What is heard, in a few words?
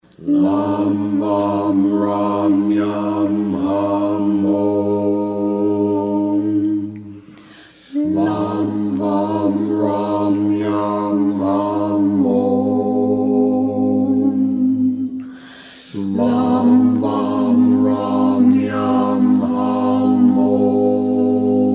Mantras; TantraNova; tantric meditations